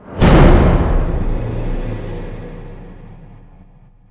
Stinger Scary; N.O.V.A. 3 Near Orbit Vanguard Alliance

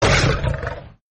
tundra beast sound; Arc of Templar

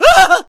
Scared Male; friday the 13th killer puzzle

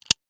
gun toy revolver firing; A Dump in the Dark

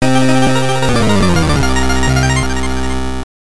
Lose Life

